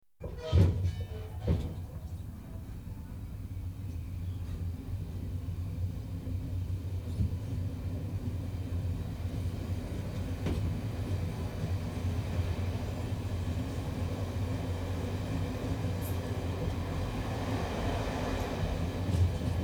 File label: Electric Trains Train starts; The BBC Sound Effects Library